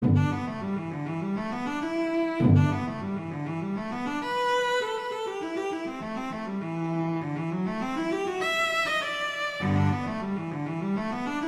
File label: Andante grazioso; Popper